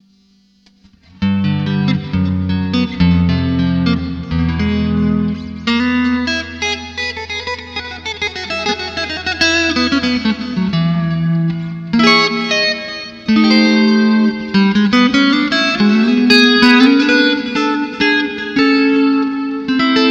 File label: clean mod, Boss GT-8